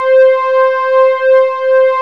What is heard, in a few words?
C6 NAPPE, Analog Fantasies, KORG; USB Soundscan vol.49; AKAI